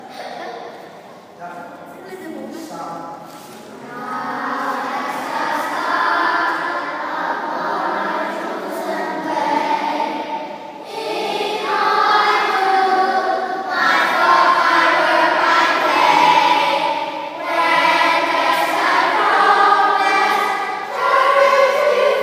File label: Scout Hymn